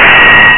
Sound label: clank; Theldrow